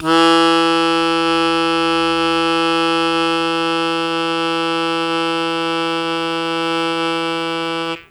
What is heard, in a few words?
Long Sustain E0, Harmonica; Zero G - Harmonica